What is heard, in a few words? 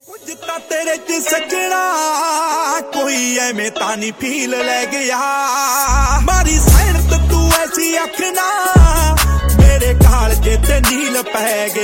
Neal ringtone